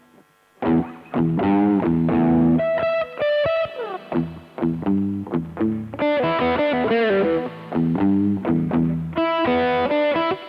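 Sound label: Marshall Clean ter Boost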